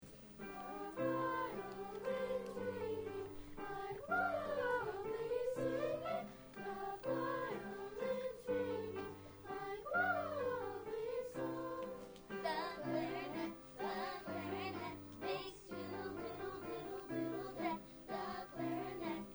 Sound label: Instruments